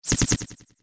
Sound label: bullets; snifit; Звуки из игры Марио